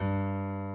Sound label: key